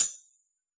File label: tambourine, Yamaha DJX Rock Kit; 144 Rock Kit